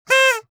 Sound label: cartoon clown fun nose sound; mixkit